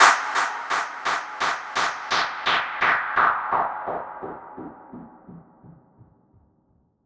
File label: FX Impact